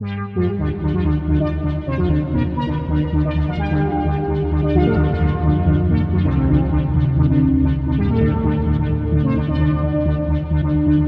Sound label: loop